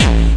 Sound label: psycore filtered hit; drum machines